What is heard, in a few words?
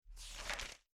Page turn; Nook BNTV250; ui